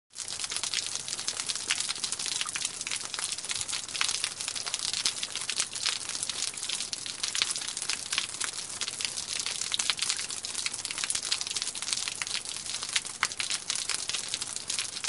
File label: Rain Splats